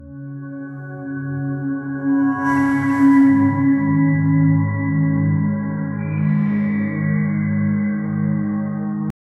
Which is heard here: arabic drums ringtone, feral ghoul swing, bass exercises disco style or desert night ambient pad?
desert night ambient pad